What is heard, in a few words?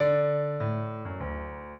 piano; Mozart; minuet